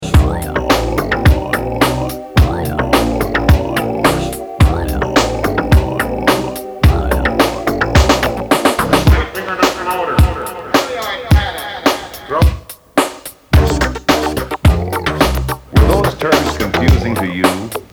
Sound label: piccolo house; Musikids – Animusic